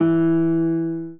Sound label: spanish guitar